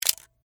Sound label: Camera Shutter; Electronics; BlastWave